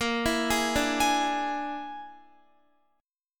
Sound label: Bb 7th Flat 5th Bb down Guitar; 4E,4A,5D,5G,5C,6F; Bb7b5 arpeggiated; JGuitar